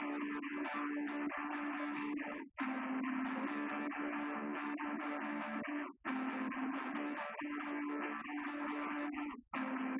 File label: wdrums liverec; SiSEC08; SiSEC underdetermined